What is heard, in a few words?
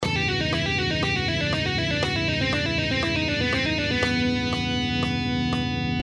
Example Dorian, guitar